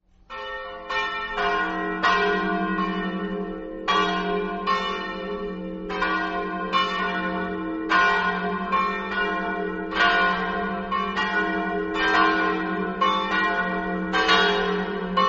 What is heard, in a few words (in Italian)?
gradisca, campane